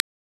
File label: Hoverboard Loop Water Splash In; Subway Surfers City; Android, iOS